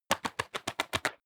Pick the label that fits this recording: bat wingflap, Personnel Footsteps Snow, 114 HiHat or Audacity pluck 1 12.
bat wingflap